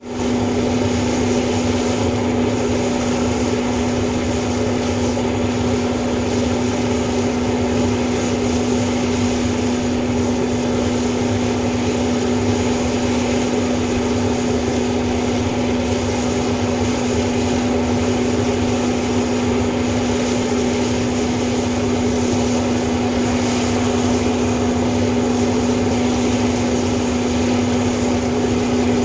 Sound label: Hovercraft turbine engine idles.; lcac